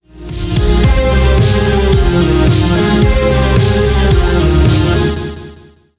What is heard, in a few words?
boombox short; pets